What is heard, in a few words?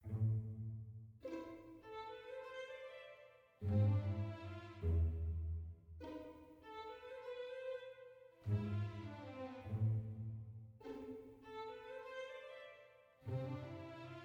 mysterious soft